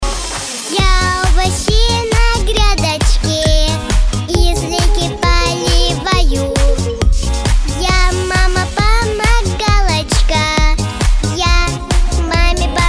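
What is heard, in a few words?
vocal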